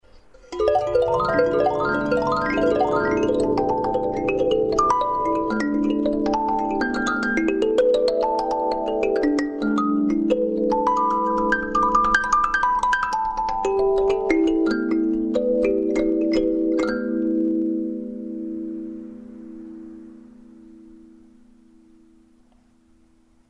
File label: kalimba